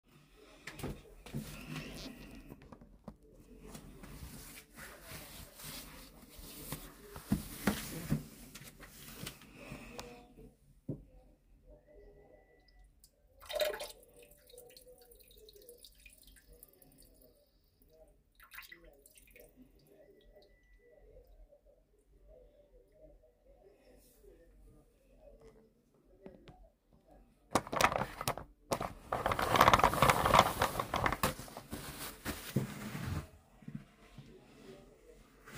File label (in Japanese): おトイレおしっこ!